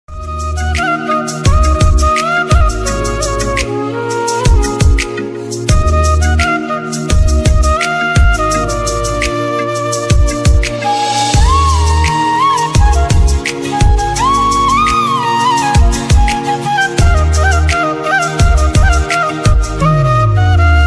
new song love hindi ringtone, bollywood

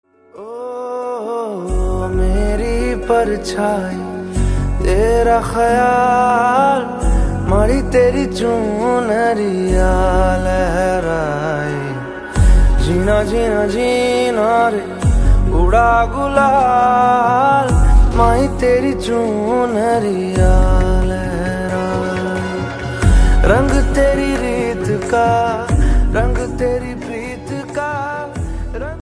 hindi ringtone

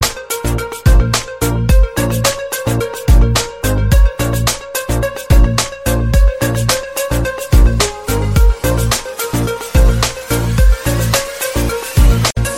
sad ringtone; ek villain

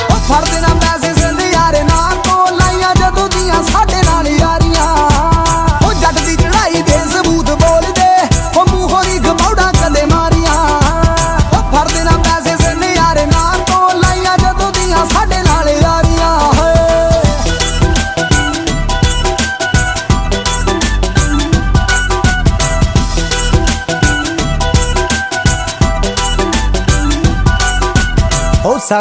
punjabi ringtone

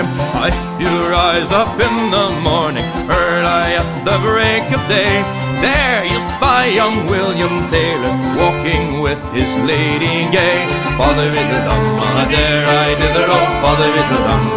drinking song ringtone

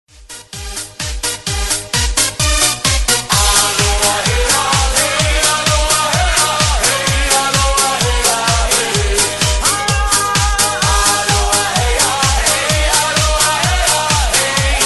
fast music tone ringtone; bollywood

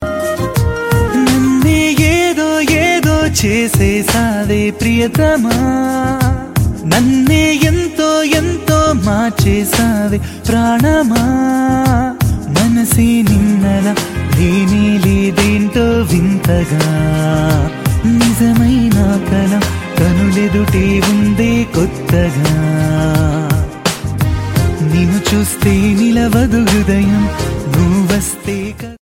telugu ringtone

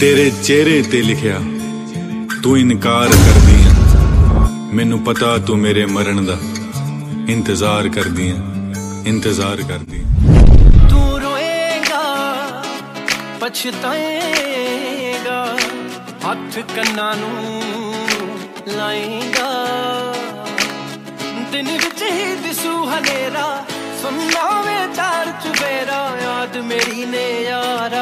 music ringtone

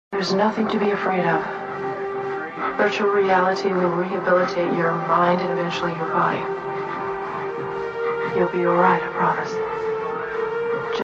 trance ringtone

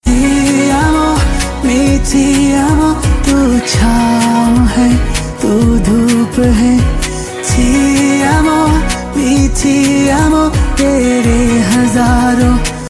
game ringtone

